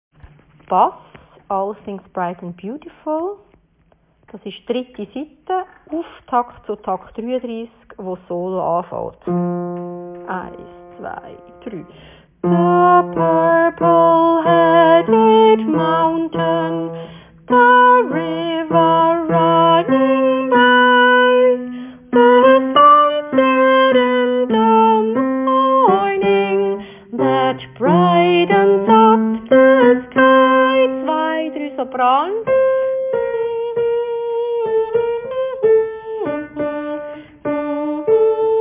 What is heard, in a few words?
Bass; All things Bass